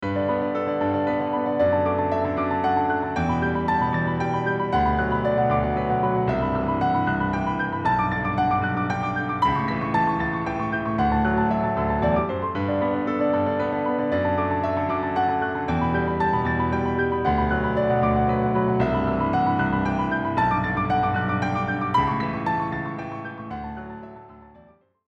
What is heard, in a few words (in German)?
rhythmische Verschiebungen; praxis spieltechnik